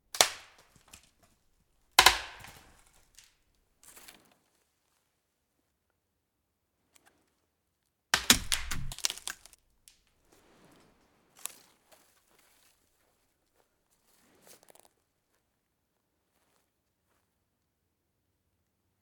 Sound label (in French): branches